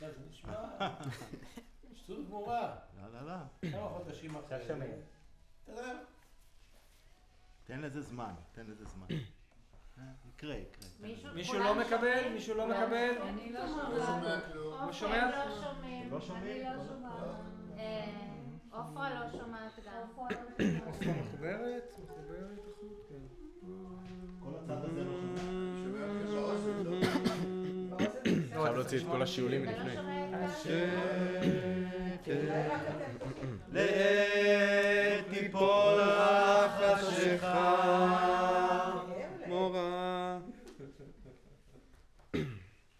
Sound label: Choir